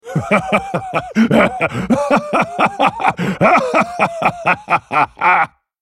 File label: laugh